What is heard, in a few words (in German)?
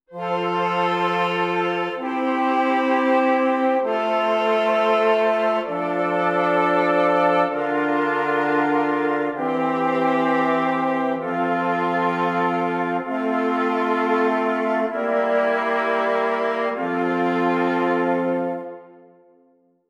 Stimmiger Contrapunkt; Ronge